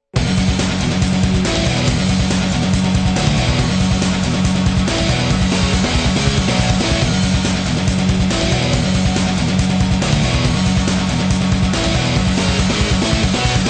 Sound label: boss battle; covenant of the guardian knights android game music